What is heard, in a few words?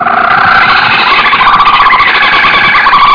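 KOOKABURRA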